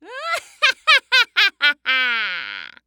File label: Horror Scary Human Female Laugh Witch Laugh